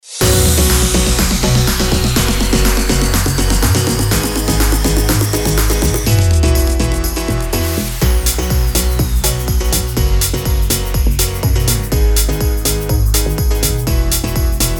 BED EDM POP BMAJ 123BPM

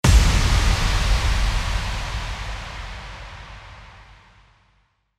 IMPACT

